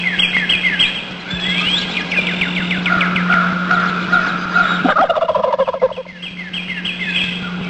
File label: turkey